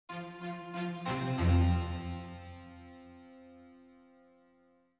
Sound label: Strings; Cairo